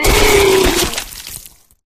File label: flesh destroyed large; flood